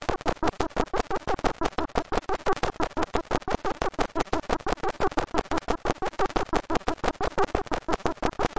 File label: laughing machine, Atmospherics